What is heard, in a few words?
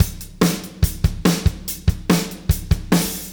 Beat Loops; Zero-G; Monster Beats